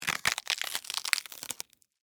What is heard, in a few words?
Crunch Tear Slow, Cabbage, Head, Vegetable; Texture